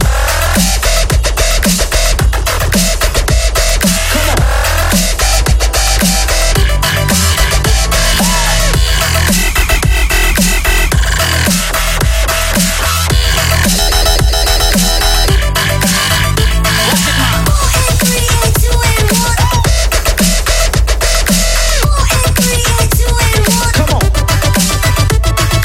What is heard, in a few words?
Dubstep